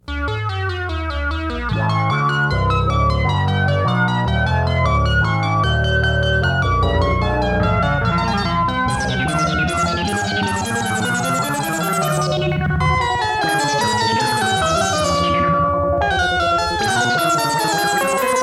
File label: Moog